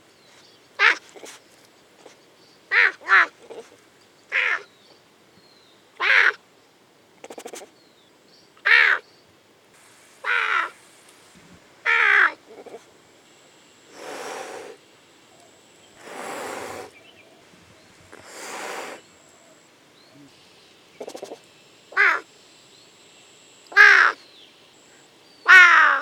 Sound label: clouded leopard